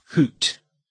hoot

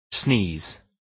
sneeze

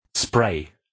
spray

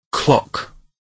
clock

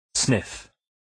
sniff

